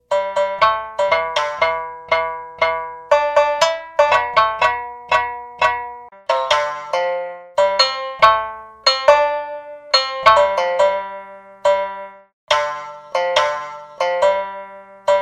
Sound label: shamisen hajiki left hand